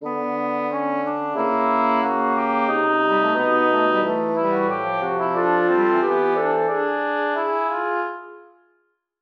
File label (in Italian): Passo Fugato